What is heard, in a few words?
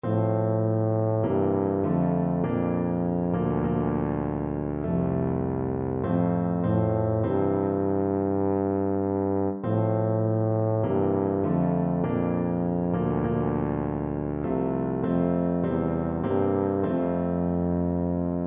nearer my god, tuba